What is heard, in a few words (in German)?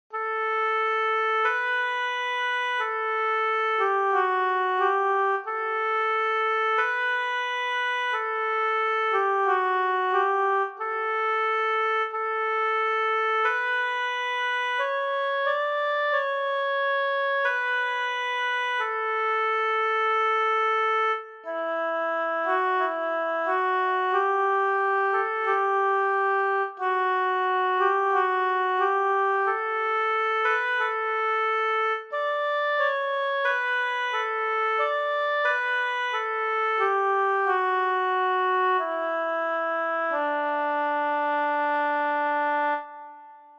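solo O du fröhliche, Oboe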